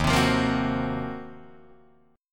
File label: D sharp Minor 6th Add 9th D sharp Guitar Open D, chord